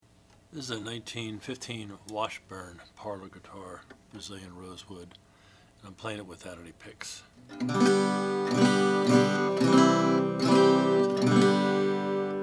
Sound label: washburn style 115 natural brazilian